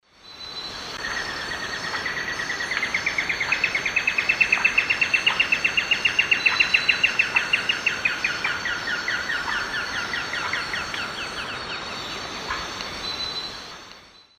Dendrocincla fuliginosa, rattle; S. America; Eastern Lowlands

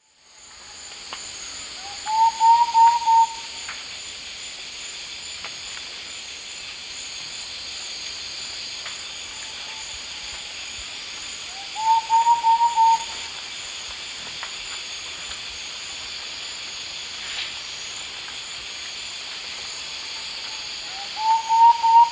Mytmothera campanisona, song; S. America; Eastern Lowlands

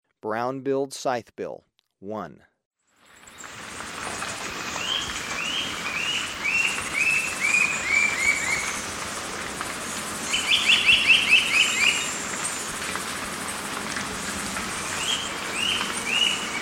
Brown-Billed Scythebill, Birds of Ecuador; S. America

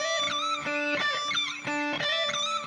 Shift Delay, Guitars

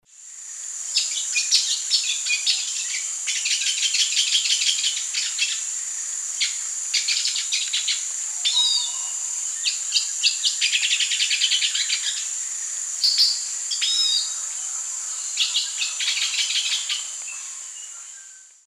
Cissopis leverianus, song; S. America; Eastern Lowlands

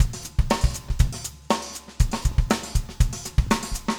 funk 120 bpm, loops

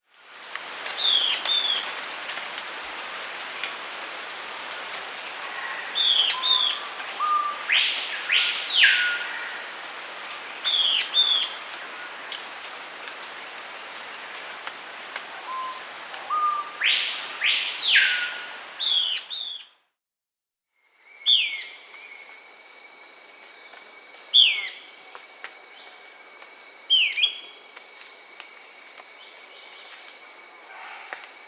Euler's Flycatcher (Lathrotriccus euleri), Peru; S. America

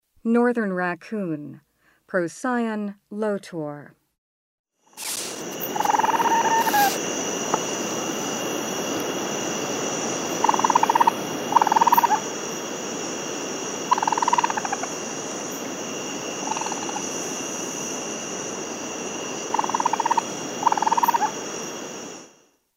Northern Raccoon; S. America

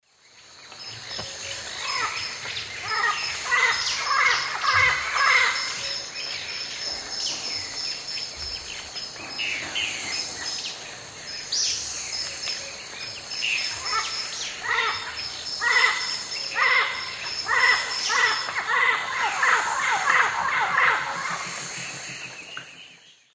Amazona ochrocephala, calls; S. America; Eastern Lowlands

